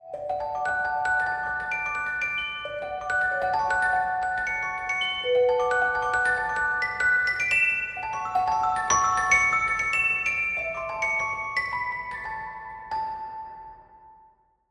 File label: Mallets of Mystery and Wonder D Major